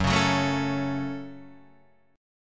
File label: A 6th Gb